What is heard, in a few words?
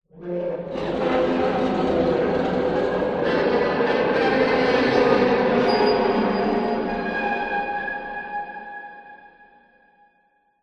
horror metal scrape processed evil scray